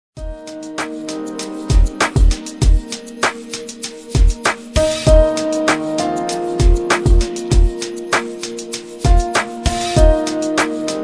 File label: Piano Step Loop; cubalibre